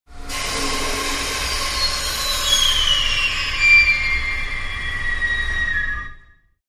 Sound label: Air Blast Fast Heavy